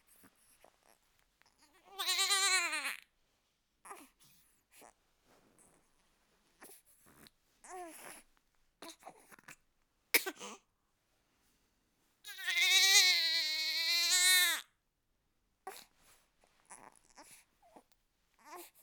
reveil

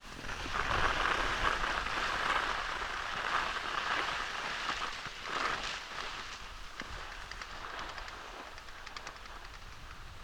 teleski

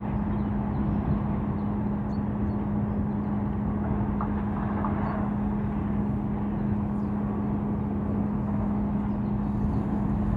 usine; rohrschollen

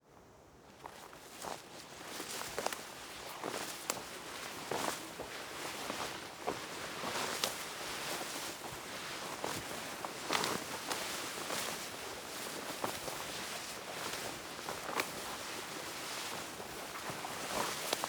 marcher herbe

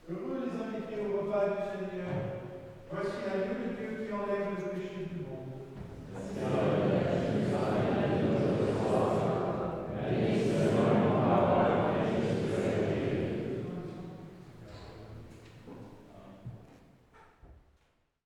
messe; sainte Odile

